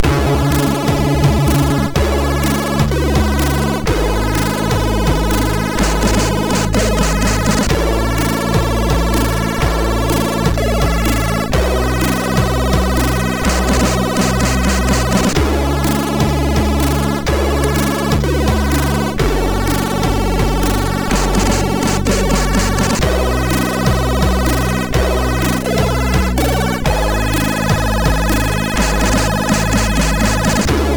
Hawkeye loader, MOS6581R4

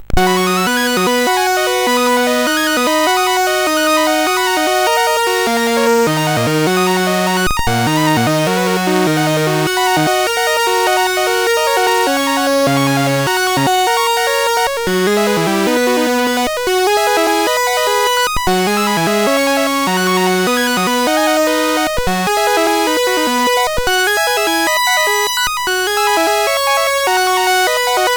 Sonar Search, CSG8580R5; Levine Thomas

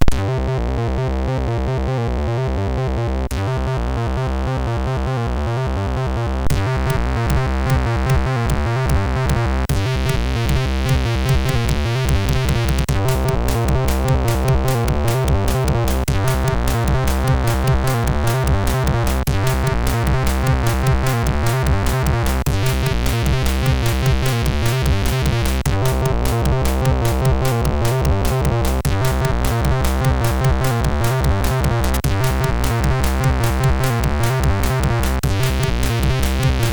Industrial, MOS6581R3

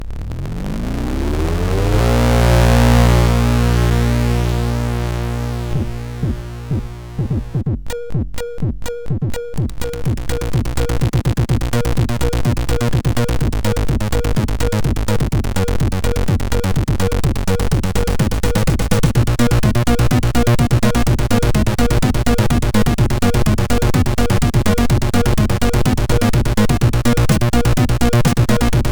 intro, MOS6581R4

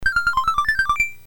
BASIC sid MOS6581R4, GAMES; Sword of Fargoal

